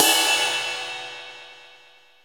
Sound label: edge, Yamaha RX-5